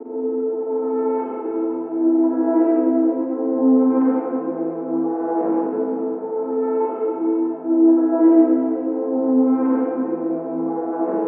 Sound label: Bm instrument loop horns, 85; horns i guess